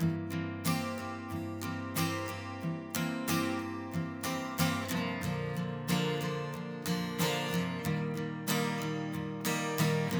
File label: Acc Gtrs